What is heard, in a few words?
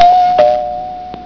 DOORBELL